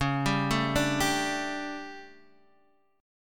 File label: D Major 7th Suspended 4th, chord